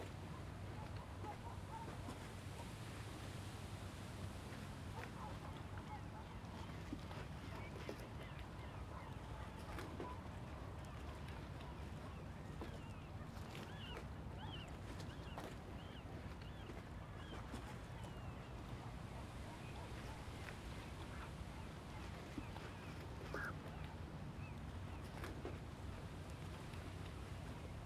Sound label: bg anubis; ui